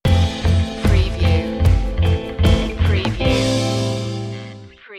time after stinger, romantic

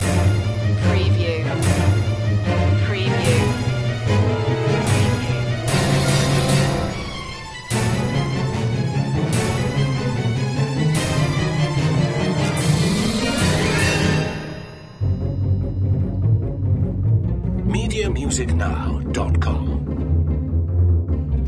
dangerous chase, dystopian

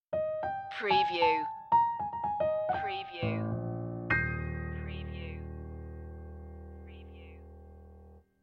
stinger, Music stinger

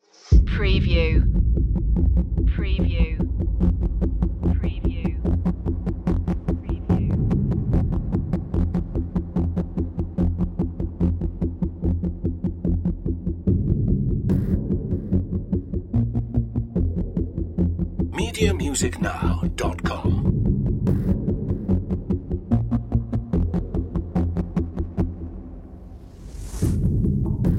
drum and bass

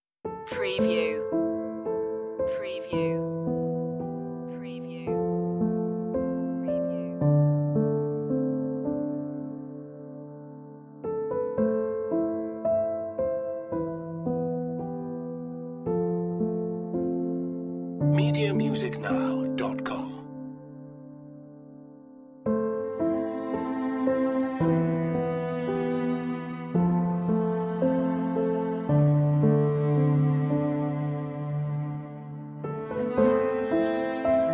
fading away, romantic love emotional

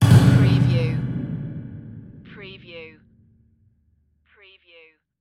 hollow digital implosion, device; the sound pack tree

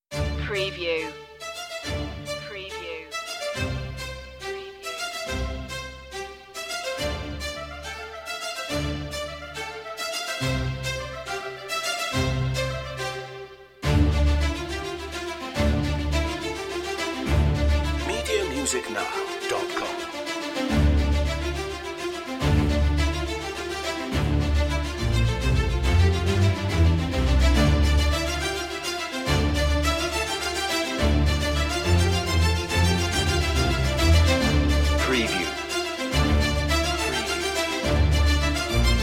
the chase, oboe

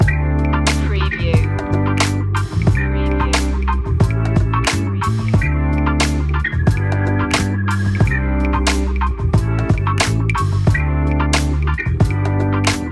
pretty cool funky loop, chill hop

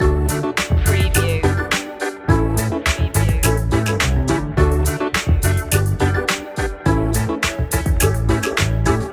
walking on air loop, pop funk

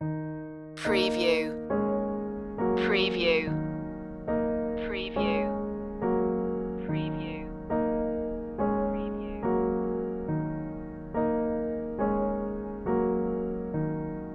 dark wood piano; sad solemn

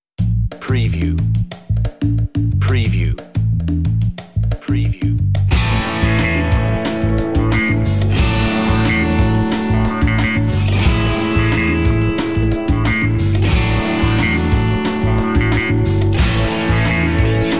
on-hold music; MediaMusicNow

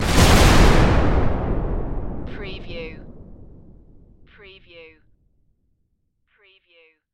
Explosion Massive; Sound Effects Bible

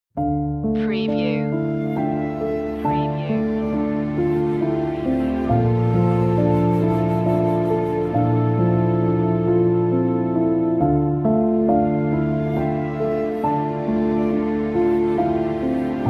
ethereal

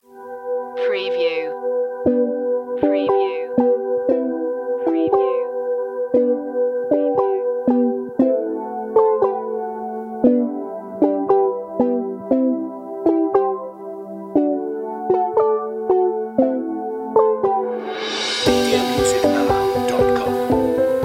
gentle rain, A cute and gentle composition; pop; indie pop; loop

